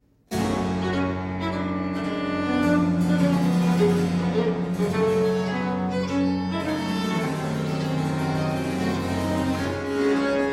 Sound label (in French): sol mineur cordes